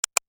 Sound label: click